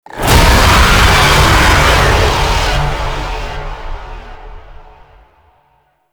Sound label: Sentient Boss Death; Fallen From Heaven